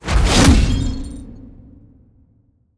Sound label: Slam Impact